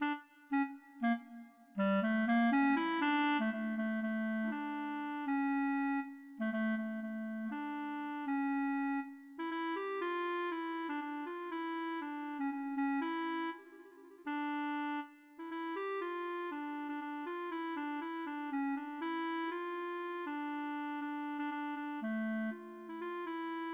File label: melodica